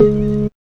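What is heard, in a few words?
organ tone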